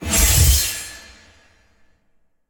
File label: Magic Spell Whoosh Fantasy Tonal Accent Hit Stab; boomerang fu; Esm Ancient Game